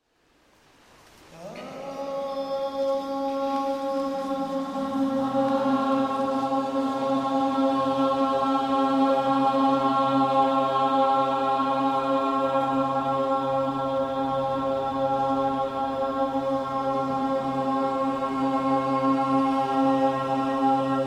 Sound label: Singing voices